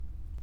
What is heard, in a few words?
Hydrophone